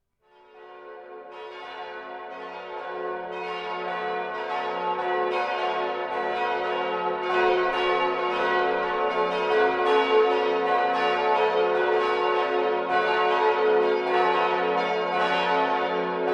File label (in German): Glocken; Melanchthon